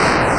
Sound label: thruster; DelphiArcadeGames; MarsRocket